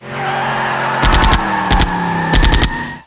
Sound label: INFANTRY; APOCALYP